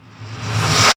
Rev Dark; Zero-G Jungle Warfare 1, 2 & 3; 90 s Sample CDs

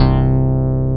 SYN, Wavestation

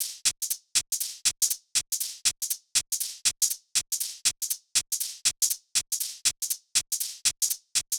Hh Sh Loop 120; Equipped Music; Loungin House

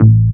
DR. LOOP, BASS; X-Static Goldmine 3

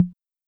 Tom Mi, Roland TR-33

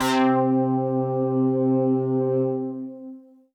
Synth C, Synth Prophet One Shots; Electronisounds

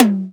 CHEAP TOM

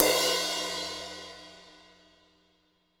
New Brush Crash, Cymbals; Roland BK-5

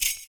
FOOT PERC; Univers Sons - Raricussions

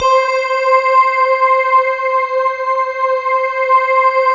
bell choir, slow bell choir

